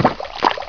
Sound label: flex pump